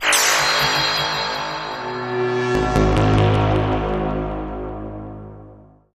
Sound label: Suspenseful Ominous Wave Transition